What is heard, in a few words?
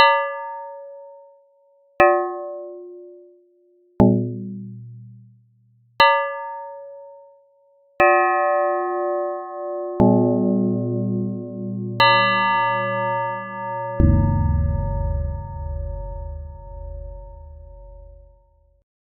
risset bell; nyquist; pmorales